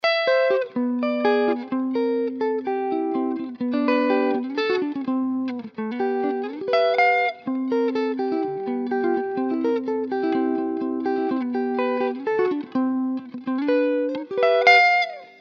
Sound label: double g, mixed highlife guitar samples